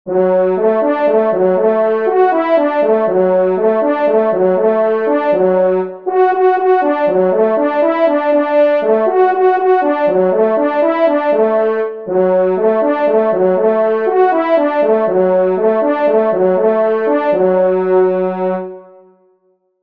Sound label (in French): Chevreuil, trompes